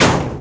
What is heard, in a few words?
bouncy metal trash hit; bouncy objects; Tony Hawk's Project 8; XBOX